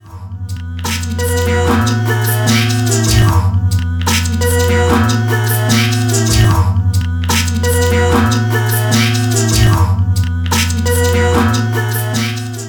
kaossilator2 Loop mit int. Mic

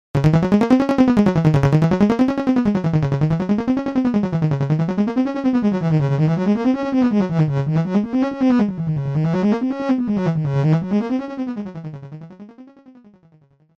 ein Blacet VCO weiches und variierendes Attack

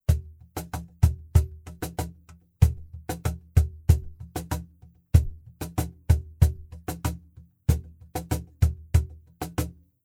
Cajon Groove AKG 414 Raum offener Pitch Control

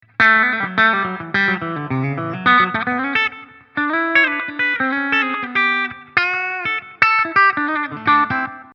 Steg HB Clean

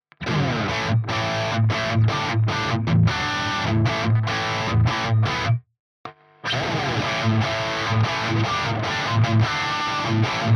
12 Uhr Gain 12 Uhr Tone GLASS

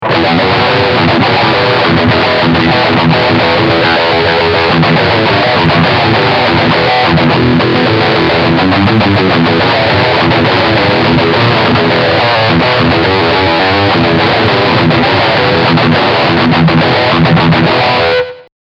Riff Steg Pickup I

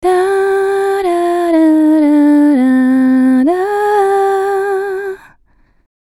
Fem. Voc. unkomprimiert

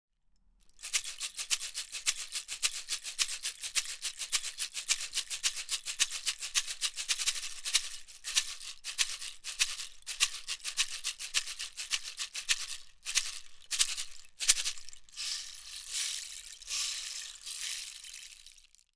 Regenmacher einzel nah

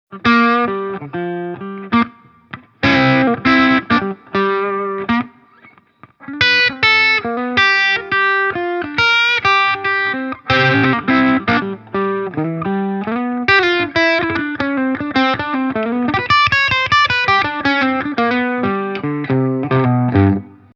AG75G Steg angezerrt; Ibanez

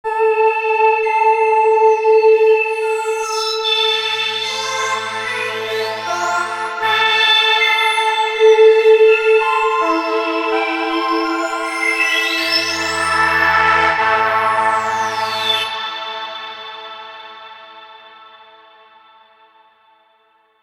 Waldorf Streichfett Plug In Hoehleneingang

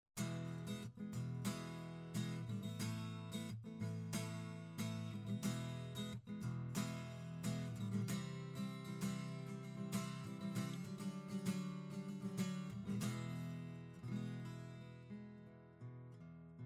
Akustik Gitarre

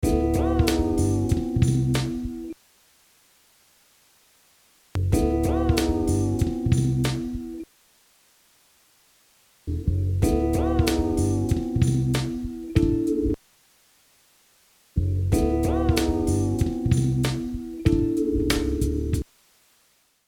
12dB SVF Signal Rausch Verhältnis; GRP Module

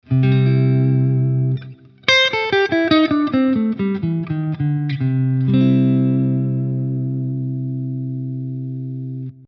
C Dur Pent; 1 Pos. down

